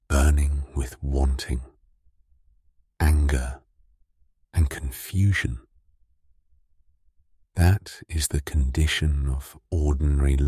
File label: drop, remix